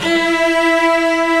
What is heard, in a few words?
STR SEC, Strings